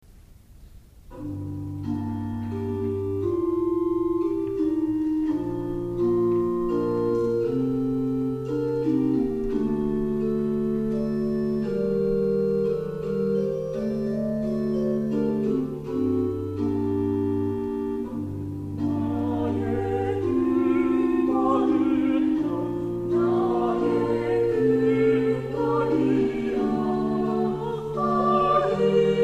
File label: Choir; K Shalom Choir; Lazarus death and revival; ラザロの死と甦り